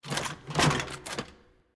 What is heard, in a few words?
Music Box Lever